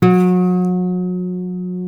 G 3 MARTIN 6, Guitars; Spectrasonics; Hans Zimmer Guitars VOL-1

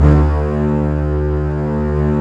STR STRBS, MIDI Section; String Master Series; Combos 2

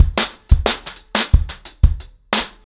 90BPM, acoustic drums, drumloops

